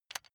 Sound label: electric button drill direction switch backward heavy